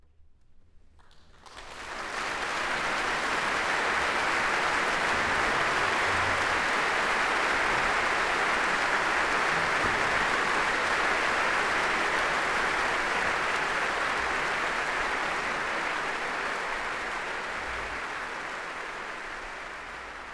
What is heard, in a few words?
Applause; Dvorak; Requiem; Symphony No 8